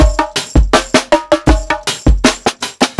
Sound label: PICCOLO 80 BONGO SORTA SWUNG, drums; Basement drums vol 2